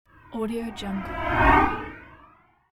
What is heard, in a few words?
flying effect; soundwood